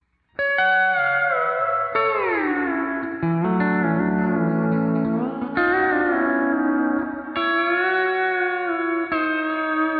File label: Plate; ToneX Pedal; Plate Reverb